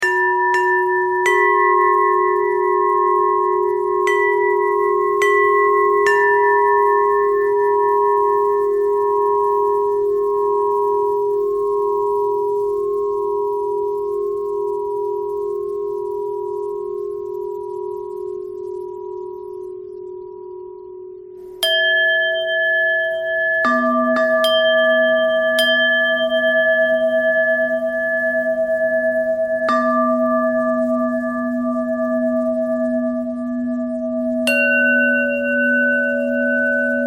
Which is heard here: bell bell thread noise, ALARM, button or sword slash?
bell bell thread noise